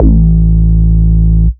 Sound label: Bass Root A; Vengeance Samples; Vengeance Ultimate Bass